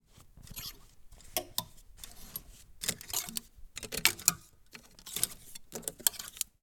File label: moving metal hangers, The Closet; 3maze